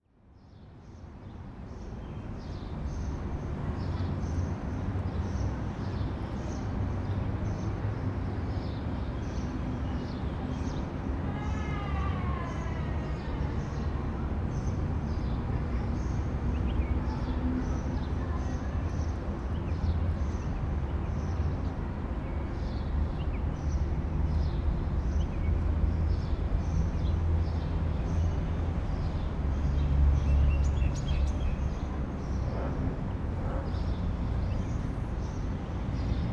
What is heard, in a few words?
day traffic birds